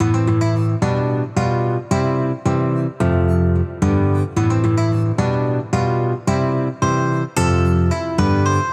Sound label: Guitar Loop 110 BPM A Min; Future Bass; Instruments; Moonlight; Guitar Loops